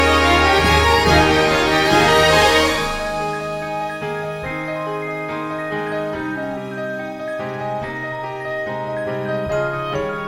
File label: audition accompaniment